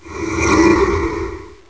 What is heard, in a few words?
beam Over; NETSTORM